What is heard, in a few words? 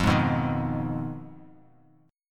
F Minor 7th Flat 5th F down Bass Standard; Fm7b5 strummed; JGuitar